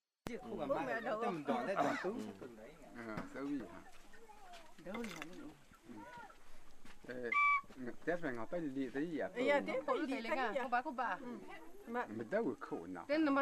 Padong yapa funeral

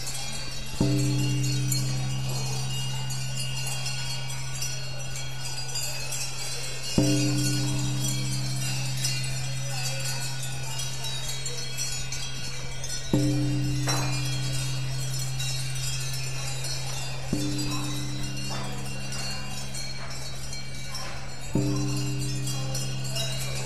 lamphun bells

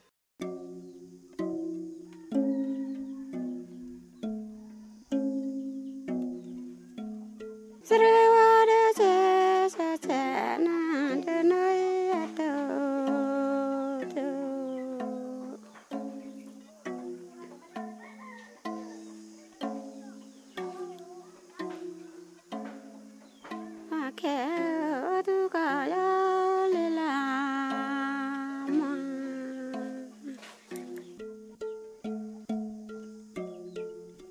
Kayaw zither

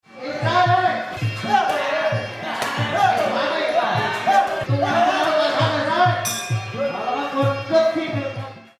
boxing

